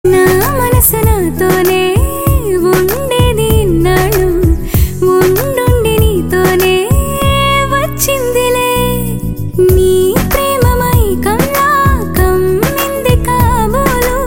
Female Ringtone

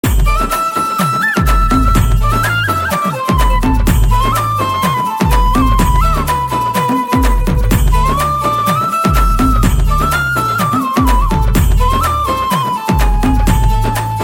Love Ringtone

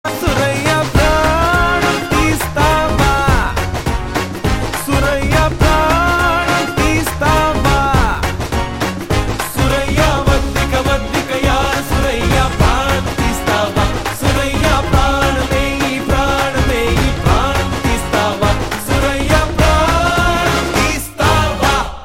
Male Ringtone

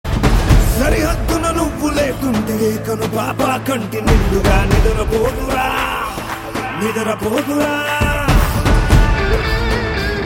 Ringtone

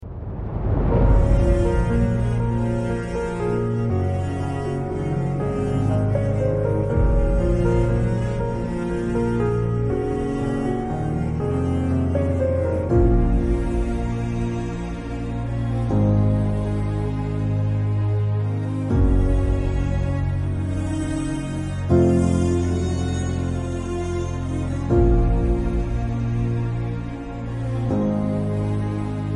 Karaoke

